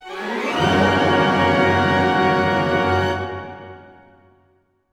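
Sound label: orchestra; Masterbits - Switch FX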